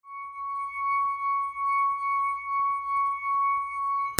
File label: MUSICAL